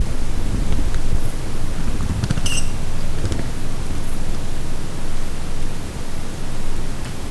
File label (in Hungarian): erdő patkósdenevérek kommunikálnak; repülés; termeszetben